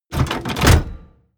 mechanical lever metal medium up

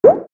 bubble

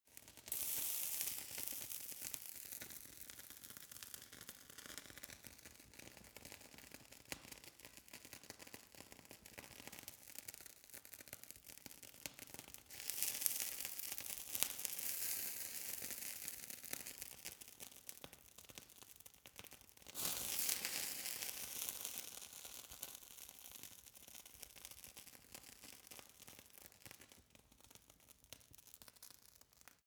fire torch burn sizzle sequence

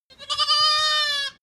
goat baa lamb stutter

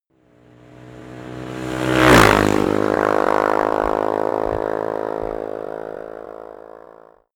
motorcycle passing by

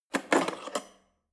cutlery fork drawer handle scrape

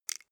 click short double

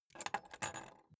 key ring small place table soft

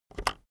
keyboard external enter press very fast soft

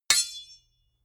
sword hit sweetener

